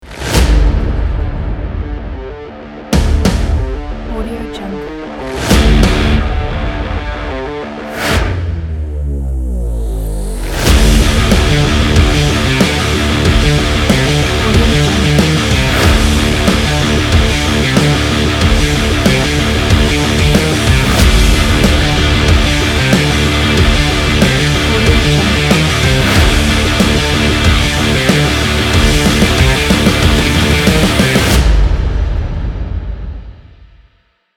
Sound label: action rock trailer